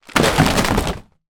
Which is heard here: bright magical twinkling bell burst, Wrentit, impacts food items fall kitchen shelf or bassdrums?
impacts food items fall kitchen shelf